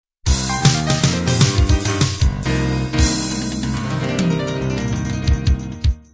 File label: boogie woogie end